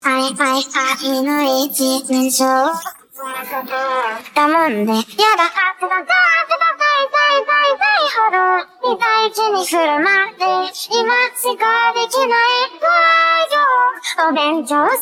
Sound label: vocal